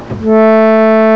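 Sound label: HARMONIUM; CMI Sound Library Rev 1.3; KEYBOARD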